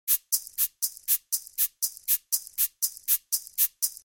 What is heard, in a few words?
maracas; guajira